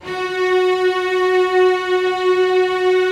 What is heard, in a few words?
STR SEC, STRINGS; Roland - LA Composer vol. 1